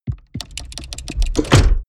mechanics large lock rattle; industrie-wirtschaft; mechanik

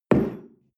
espresso place tablecloth slide, restaurant cafe

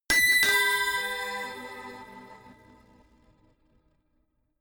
notification glockenspiel crazy third down; instrumente

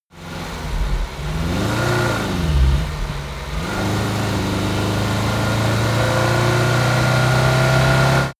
car dacia driving accelerate gear shift

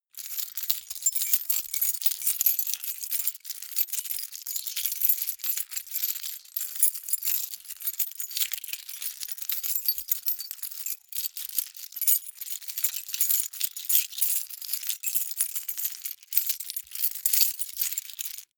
key ring large rattle sequence hard; objekte; schluessel

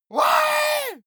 monster growl attack high; schreie

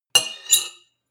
cutlery on plate scrape squeak; berufe; gastronomie; soundpool

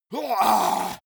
monster growl attack; schreie

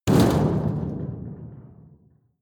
metal impact sheet rumble rattle medium; hits explosionen; metall

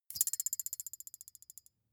thin metal boing vibrate

